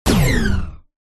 fully charged energy impact medium deep powerful alien spaceship big; gun hit; Alexander Gastrell